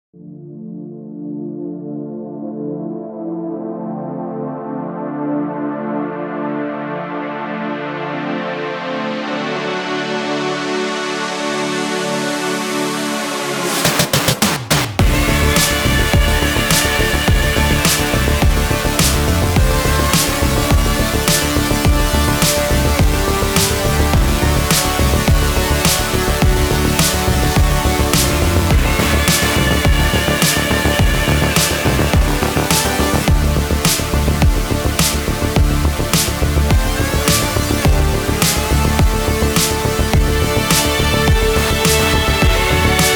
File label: Distortion; Bright Rhyhhm